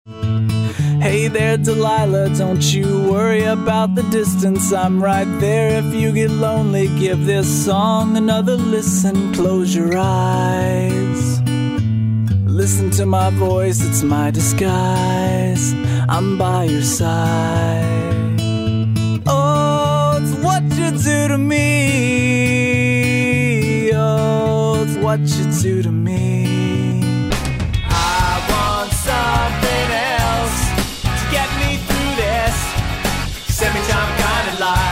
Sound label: Singing